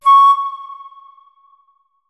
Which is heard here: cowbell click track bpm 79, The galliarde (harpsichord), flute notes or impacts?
flute notes